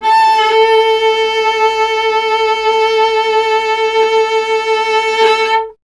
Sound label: viola A; Miroslav Vitous; Solo Instruments VOL-1